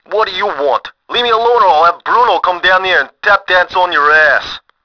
phone; SINDEMO

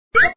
Jump; crazy ambulance

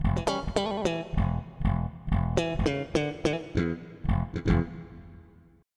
slap bass; 16 Bit Digital Sound Masterpiece

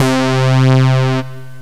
saw wave, synth lead; Software Explosion 2 - Virtual Computer Pets; yamaha xg

